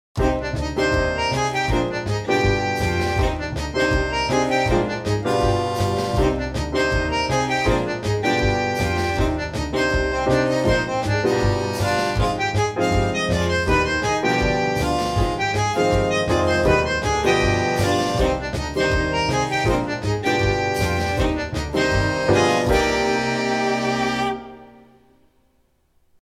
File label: MILONGA